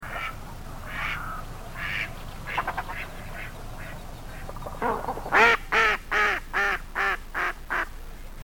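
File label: mallard mating calls, birds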